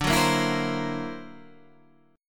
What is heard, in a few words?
D Augmented 9th, guitar